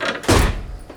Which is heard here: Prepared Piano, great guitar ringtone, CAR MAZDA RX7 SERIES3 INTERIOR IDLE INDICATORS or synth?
Prepared Piano